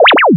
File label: Electro FX; Ueb HE Electro FX